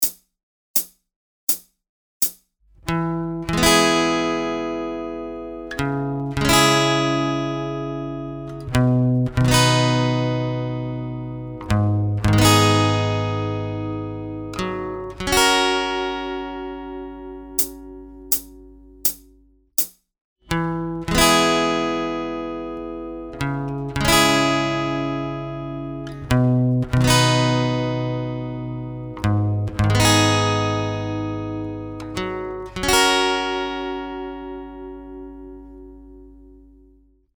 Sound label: Root Note Targeting capo 4 SLOW, strumming; Ultimate guide to strumming book